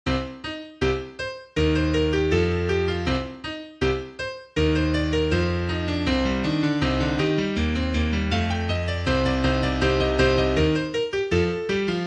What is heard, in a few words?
chromatic